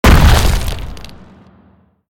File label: Groundpound; SFX Monsters Hellboss